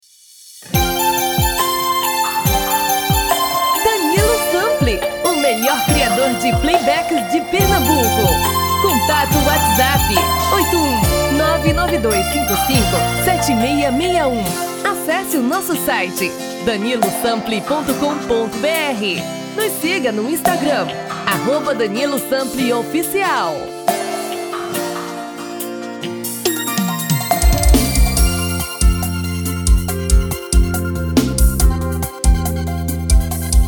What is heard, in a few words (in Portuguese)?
Brega um tom e meio acima